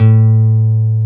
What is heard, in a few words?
Jazz Guitar Emperor A2; 90's Sample CDs; Club-50 - Foundations Roland; Jaz-Emperor